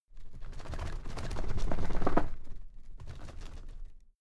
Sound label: drawer cabinet paper rattle short